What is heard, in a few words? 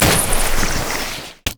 item sightward snowman; LoL